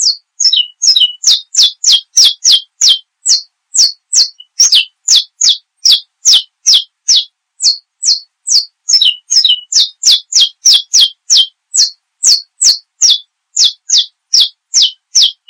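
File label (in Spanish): canario, ringtone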